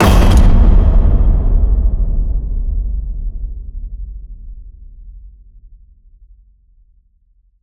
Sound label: HIT Metal Crash, Cinematic Hits Designed